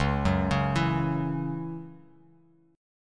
C sharp Minor Double Flat 5th C sharp Bass Standard; C#mbb5; arpeggiated; JGuitar